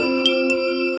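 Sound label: a soft peaceful and satisfying, chimes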